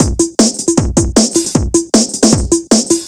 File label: 155 Dancehall Full, Drum Loops; Zero-G; Soundclash Nu Dance Electro; Acidized Wavs; 155bpm; Dancehall Fix